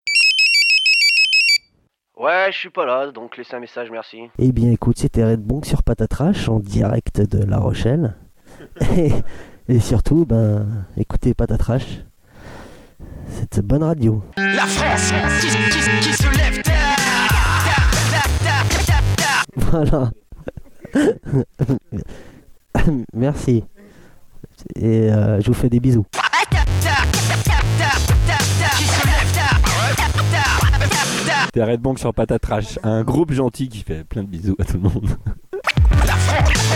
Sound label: jingle